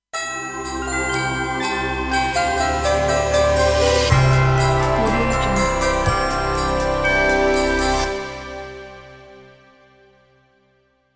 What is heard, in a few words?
chrismas joy ident; simonleng